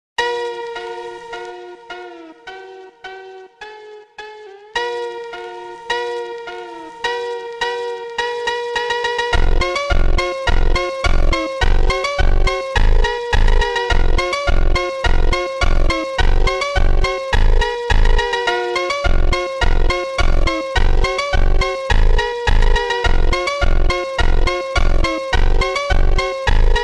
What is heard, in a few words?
attitude ringtone bgm ringtones english ringtone